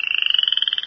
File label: bugs, jungle